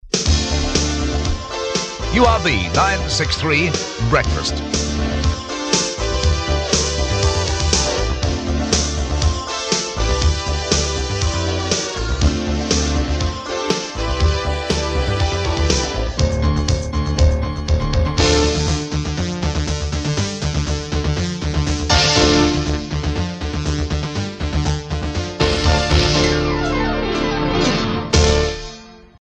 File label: jingle, synth bed; breakfast